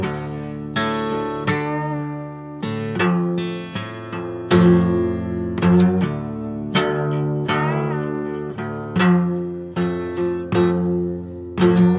12string; work-sin-progress

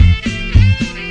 two step festive, 108; SAMPLED MUSIC LOOPS

